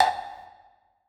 Chest Hover; netent

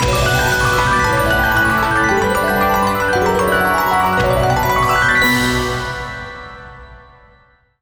big win; netent

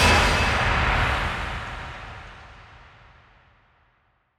big win end free spin; netent

